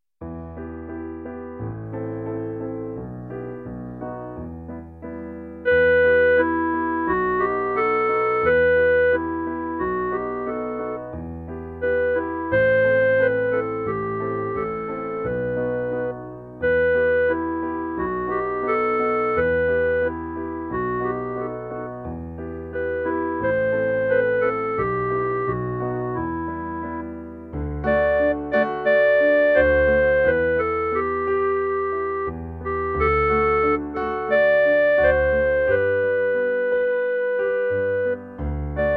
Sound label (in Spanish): CLARINETE